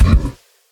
骆驼冲刺声

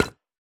饰纹陶罐踩踏声

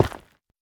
下界岩破坏声

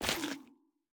幽匿踩踏声

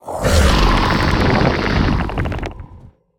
监守者咆哮声

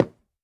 樱花木破坏声

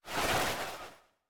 旋风人滑动声

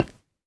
骨块踩踏声

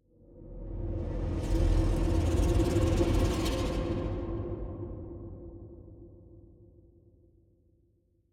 玄武岩三角洲扭曲声; ambient

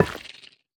幽匿催发体放置声

